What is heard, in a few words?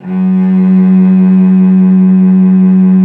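F#2, cello vibrato; Xsample VOL-7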